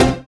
Synths Root C

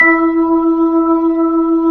B-3, Jazz

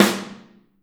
SN OH L, Kit OH Mic, Drums Percussion

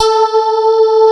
STRIA, Twisted Textures; STRYNGI

